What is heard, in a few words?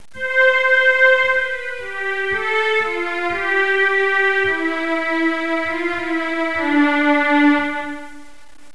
strings